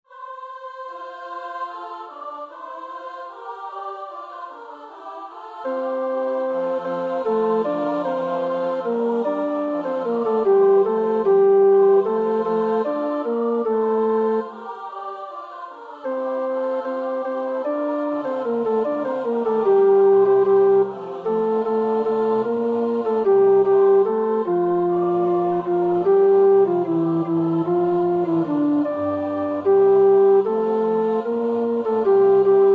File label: tenor; Lasso (Orlando di)